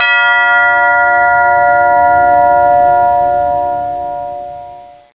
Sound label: DOORBELL; Casio Colordesk Slides and Sound 1997